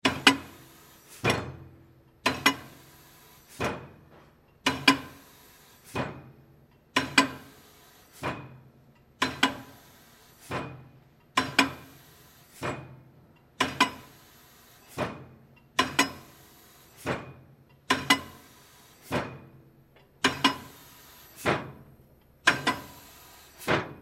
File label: Metal Bender Pound Stamper; Materials; Industry; Sound Effects:Materials; Blastwave